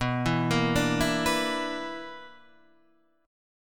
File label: B-Minor Major 7th Double Flat 5th; BmM7bb5 Chord {7 7 8 7 5 7} arpeggiated